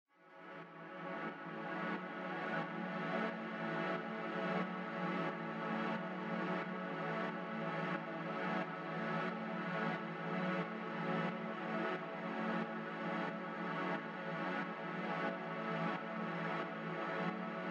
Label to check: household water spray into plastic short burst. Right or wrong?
Wrong